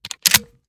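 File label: reload empty chamber, shotgun; reloads; iw8; romeo870; gmod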